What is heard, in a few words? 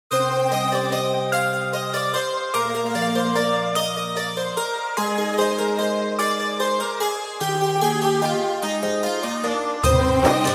instrumental